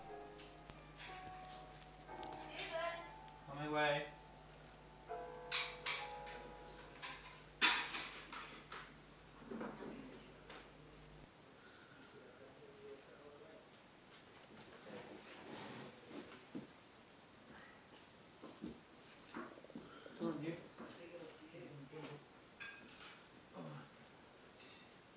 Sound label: Boxing Day soundscape